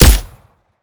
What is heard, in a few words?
sup, usp; weapons; Benny Placeholders